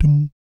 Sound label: BASS DUM; Spectrasonics; Vocal Planet; Jazz FX